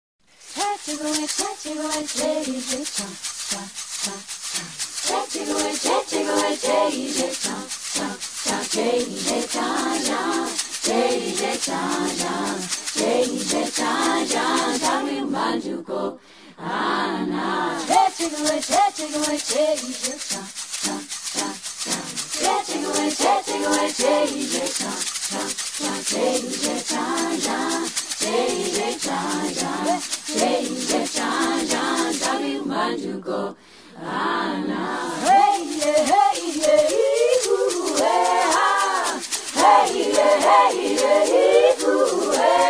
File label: Rattle Song